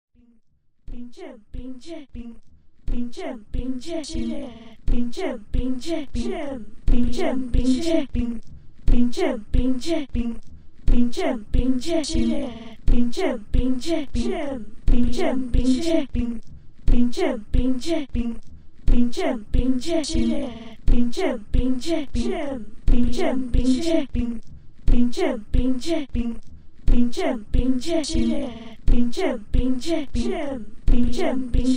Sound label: Beatbox